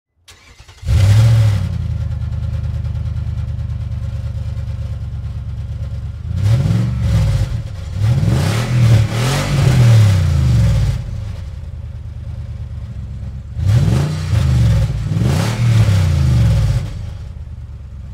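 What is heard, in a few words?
Motorcycle handle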